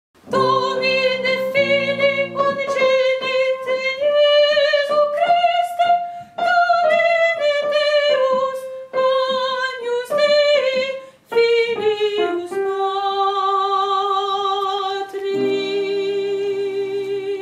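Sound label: soprano; gloria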